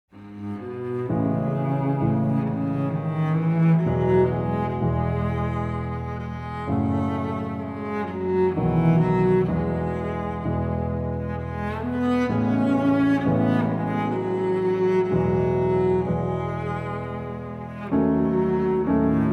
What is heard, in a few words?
Event BGM; Xenoblade Chronicles 3